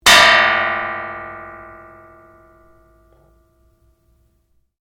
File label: Cymbal 6 Inch Bent, cheap brass cymbal; F; Cymbals; Musical; SoundEffects Foundation2